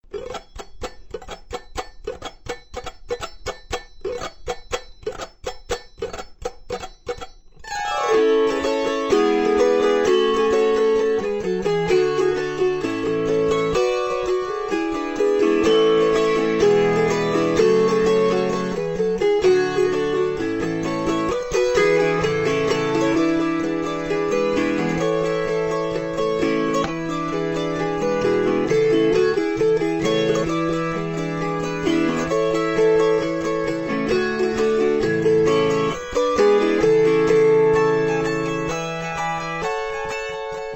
March of St Timothy autoharp

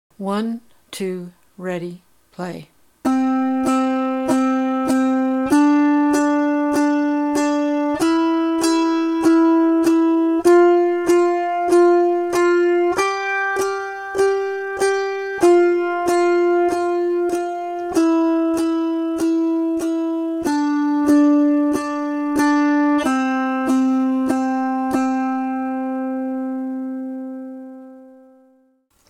Do to Sol 4 Plucks Each

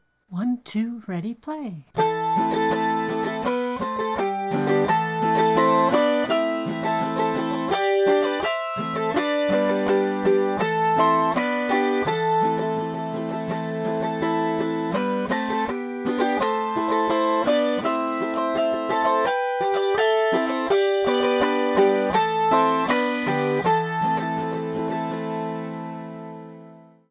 Shady Grove faster

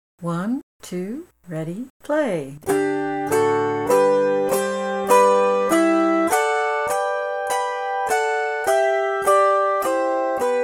G exercise

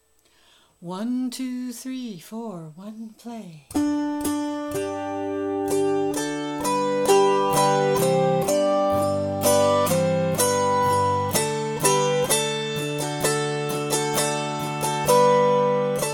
Simple Gifts Color Chords

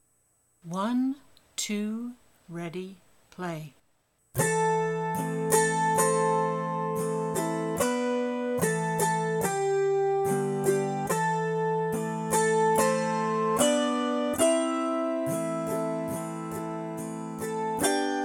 Shady Grove slow

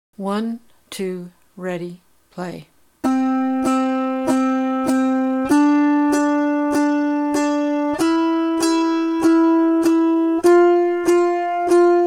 Do to Fa 4 plucks Each

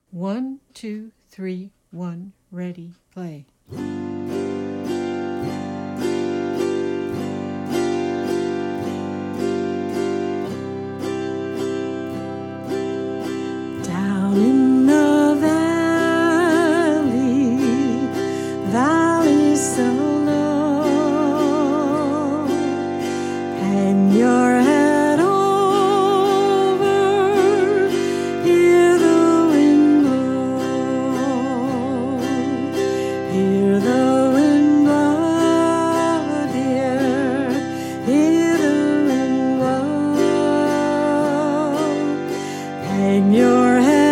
Down In the Valley Low High

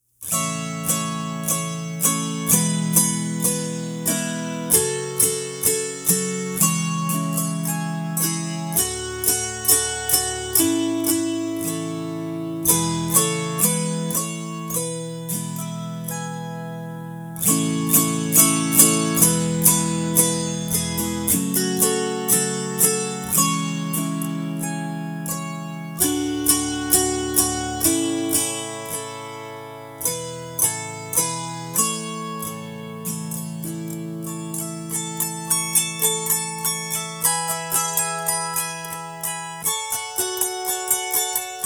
Jolly Old St. Nicholas

